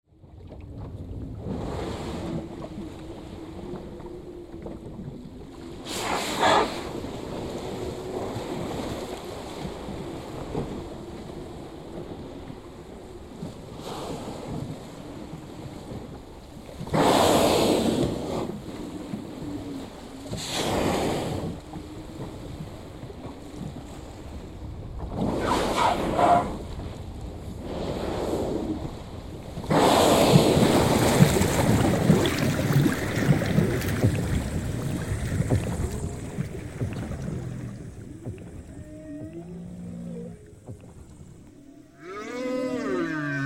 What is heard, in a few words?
Ocean Humpback whale above then below 3D binaural; ASMR